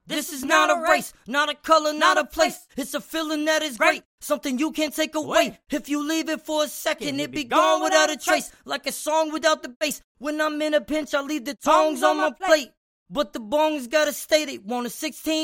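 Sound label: Hip Hop 80 Em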